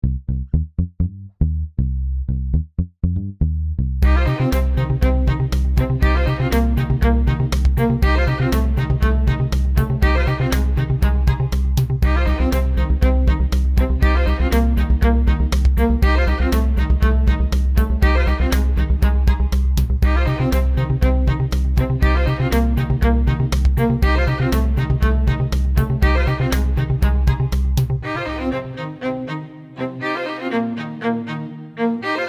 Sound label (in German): jingle streicher